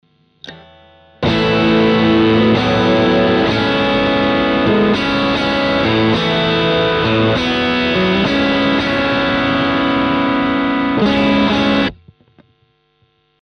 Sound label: SPECIAL CRANKER Ge Chords max Gain